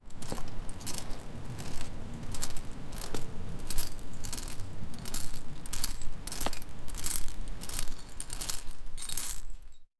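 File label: walking on iron, footsteps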